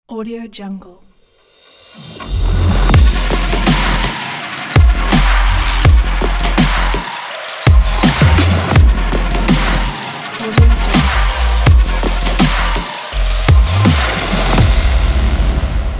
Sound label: glitch dubstep intro logo; imgsound